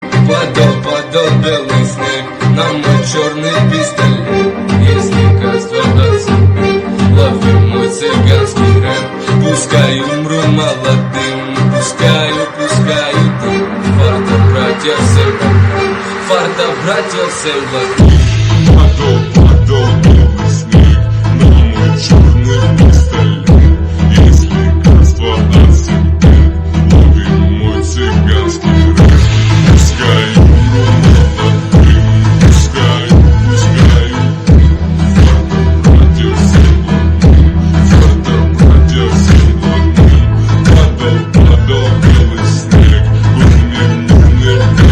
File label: bass slow remix